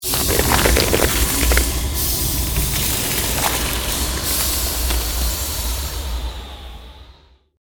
CREATED MAGIC SPELL BLIZZARD SHARDS; A series of magic or arcane spell sounds designed to suit fantasy themes, Arcane,Created,Fantasy Magic,Ice Magic

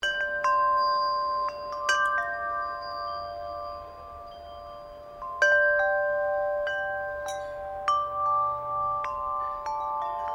Wind Chime Garden Type Loop

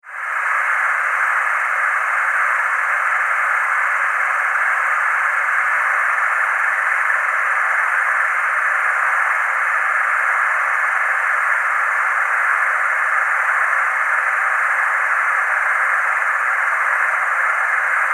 Alien, Locust, Swarm, Scifi; 5.1

